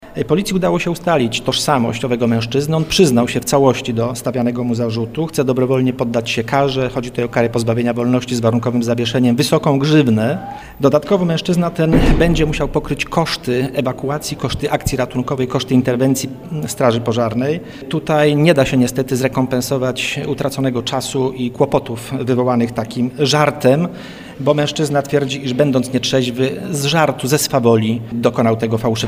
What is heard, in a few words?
bas; bomba